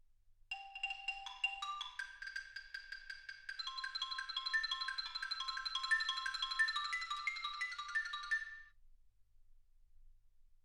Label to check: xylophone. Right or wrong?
Right